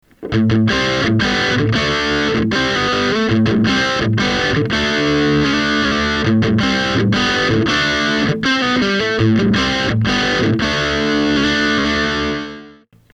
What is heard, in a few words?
pd-5 rock